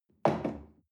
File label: chair wood place soft